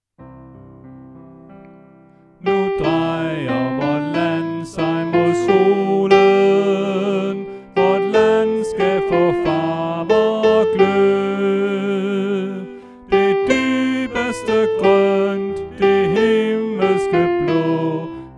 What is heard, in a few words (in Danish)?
Sopran; oevefiler